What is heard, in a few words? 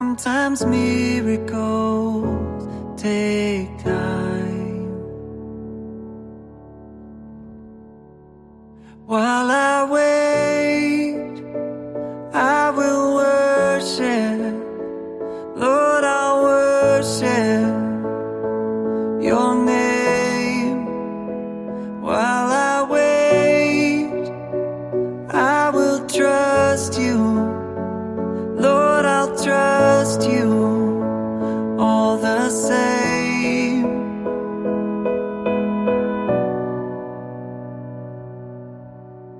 Acoustic